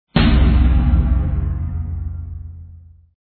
Defeat

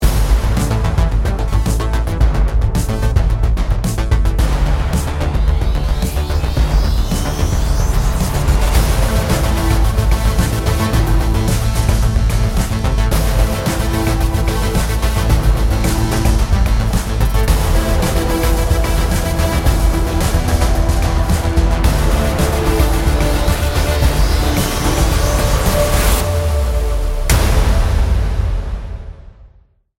Production Music; Invicta Beam

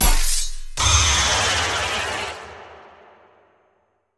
Spike spawn; Eyeboss; Super Mombo Quest

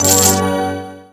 Drop money; Conquer Online 2.0

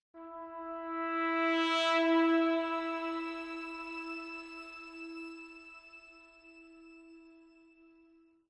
Ambient Timer; Brothers in Arms 3: Sons of War; Android

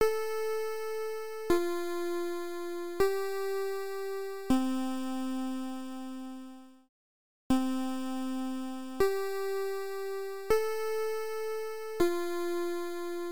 Standard Chime; Metropolismania; Gameboy

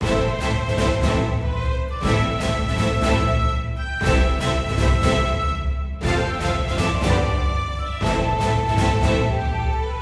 heroic forte

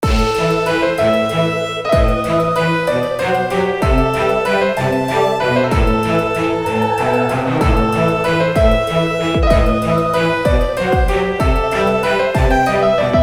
Halloween Forest; Secrets of Grindea

